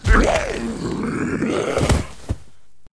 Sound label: MantisRaptor DIE; Eft